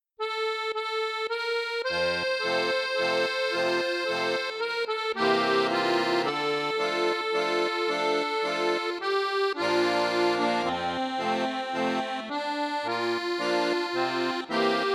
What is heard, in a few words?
Irish Ballad